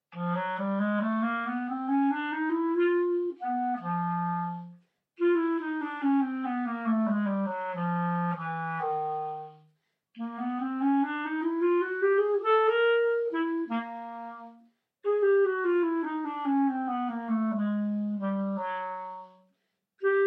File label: clarinet